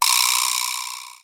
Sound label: VIBRA, PERCUSSION; Crypton - DrumBase5000